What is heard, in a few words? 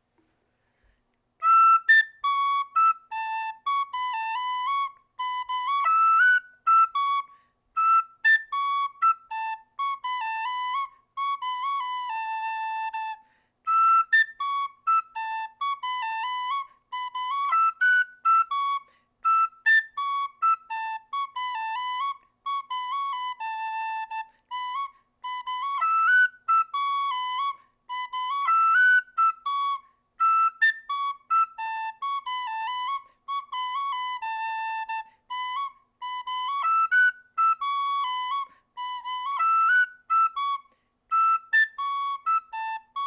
Slide slow, fiddle; Johnny OLearys